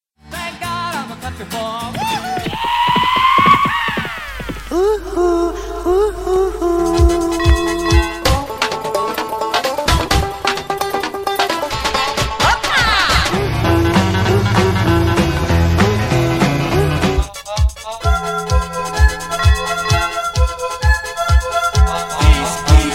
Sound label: country mix